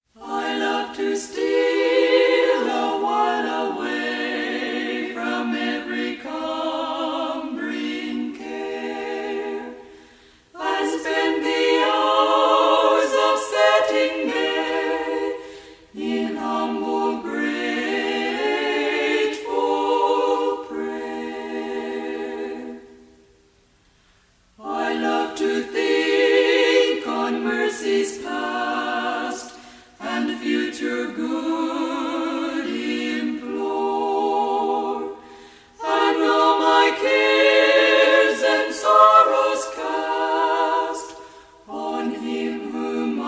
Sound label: choir